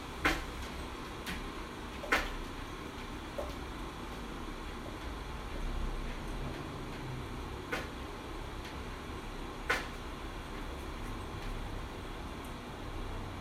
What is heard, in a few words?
Soundscape